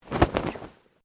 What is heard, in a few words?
Clothing Shirt Cotton Flap Sharp; Movemement sounds for various articles of clothing; Dressing